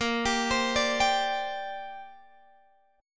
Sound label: Bb 6th Add 9th Bb Guitar Open G